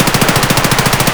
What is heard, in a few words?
mpx indoor close loop; Escape from Tarkov